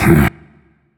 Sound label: nv sigh; smite; zhongkui